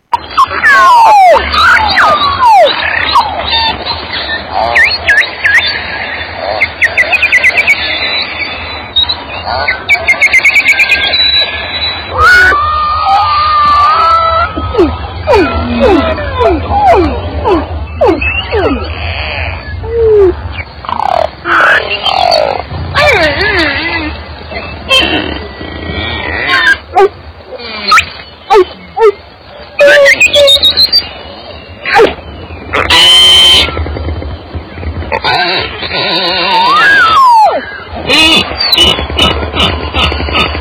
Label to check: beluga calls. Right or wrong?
Right